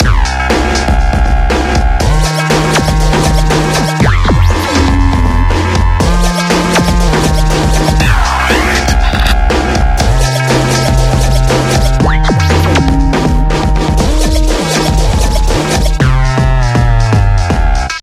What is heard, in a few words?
Theme (Loop)